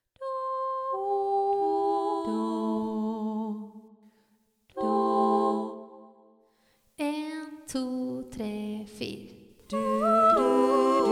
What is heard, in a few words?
alle stemmer